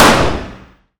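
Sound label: fire distant 45acp pistol interior; gmod; arccw uc commons attachments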